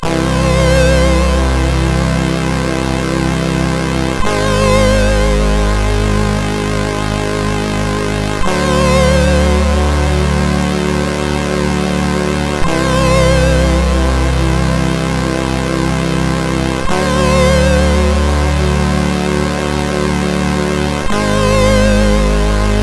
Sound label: E-Load loader, CSG8580R5; hvsc